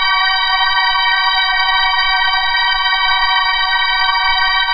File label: major pad loop; LOOPING AMBIENCE